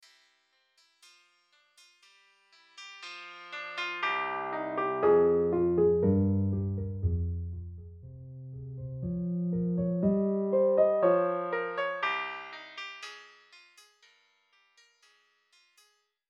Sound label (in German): Bandpass